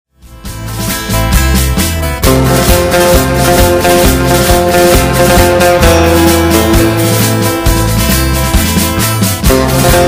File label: Gold Grade Middle